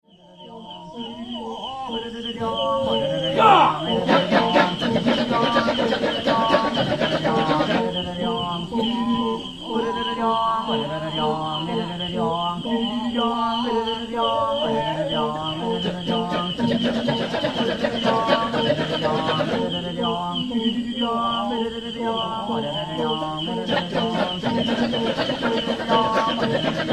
kecak kecak

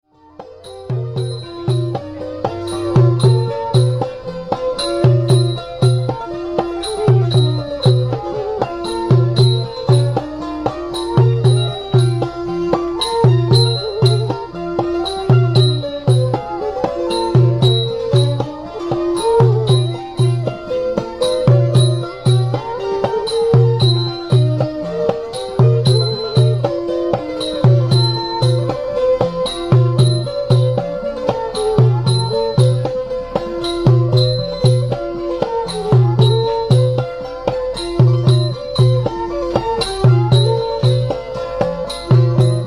band; landmine victims band

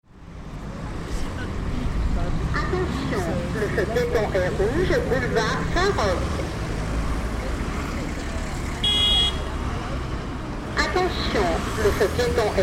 pedestrian crossing; mario brothers

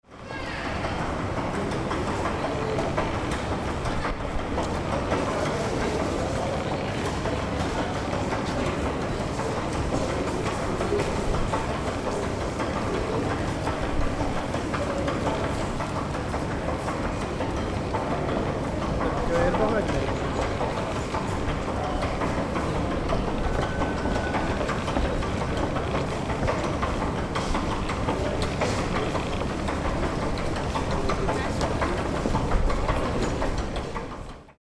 galloping escalator

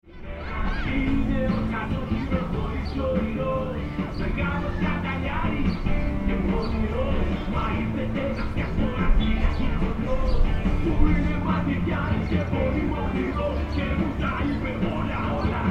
greek merrygoround

